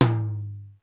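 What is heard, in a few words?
mid flat tom, sr16 tom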